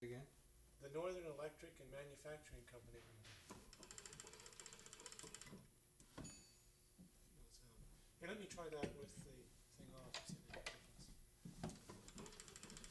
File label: MAGNETO TELEPHONE, with announcements